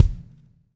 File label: SLIK